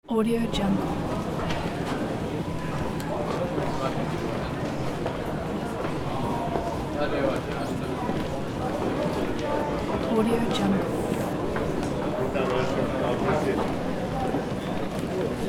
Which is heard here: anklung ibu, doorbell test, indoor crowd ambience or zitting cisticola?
indoor crowd ambience